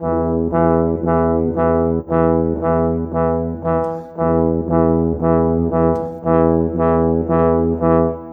Trombone Tuba, Cinematic; Sonic Foundry (Sony Creative Software); Orchestral Series VOL-3 - Cinematic

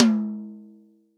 REAL DRUMS POP KIT

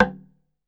TUNG LO, African Perc

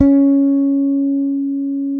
bass sustain C#4; Sampleheads; Will Lee Bass Library

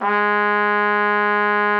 TRUMPET G#3; Xsample VOL-3

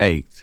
VOX GENERAL; AMG Megabass REMIX! VOL-2

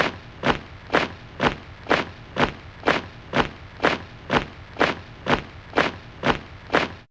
Marching, Fairlight CMI IIe

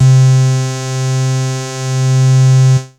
Big Synth Basses

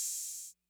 Hats Open Mid Decay, 808

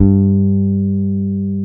FEND P-BASS G2

